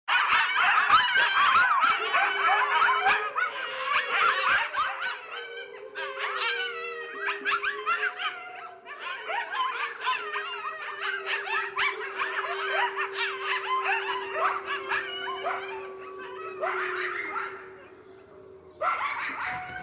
Coyote Pack Howling

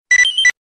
Stalker Pda SMS

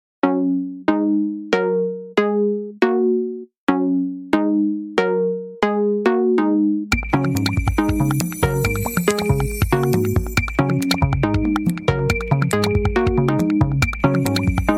Samsung Standard

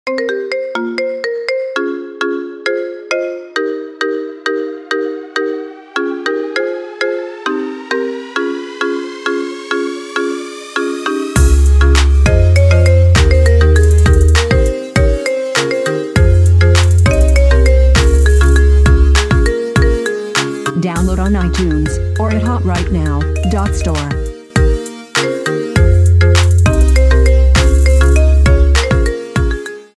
Freaky Friday Marimba

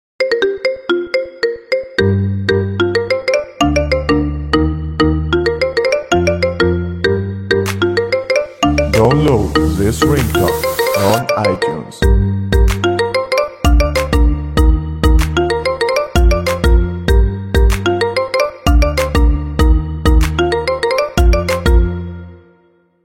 sos marimba

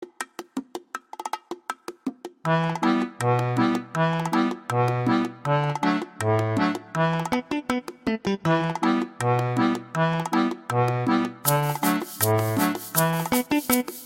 Dance Latin

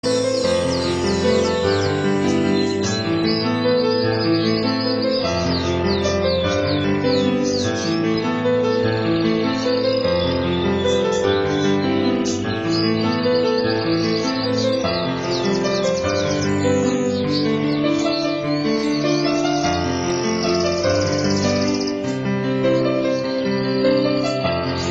Relax Chinese Music

